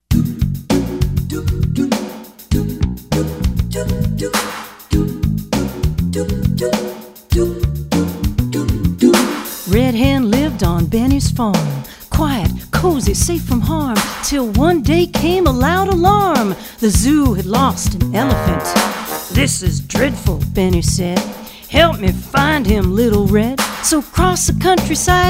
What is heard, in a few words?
errant elephant vocal